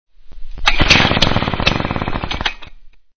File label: starter